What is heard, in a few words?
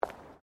FOOTSTEPS WOMENS LOW HEELS PAVERS STEP; Footstep Foley; Footsteps (Sound Effects:Human)